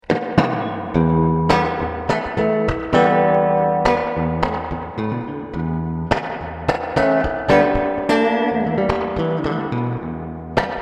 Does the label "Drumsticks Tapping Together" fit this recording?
No